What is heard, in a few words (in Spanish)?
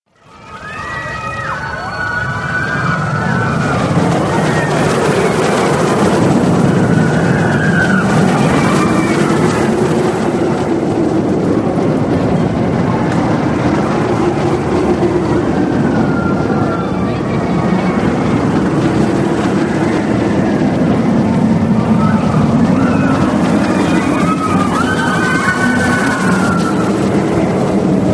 montana Rusa gritos atracciones; efectos